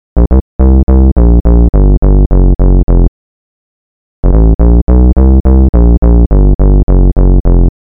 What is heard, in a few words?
Bass